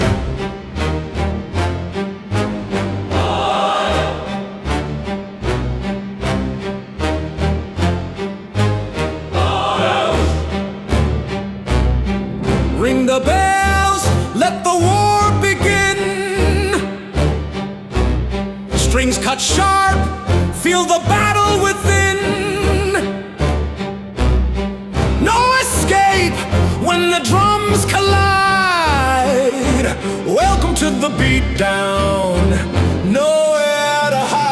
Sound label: Bell Ringer; the beat down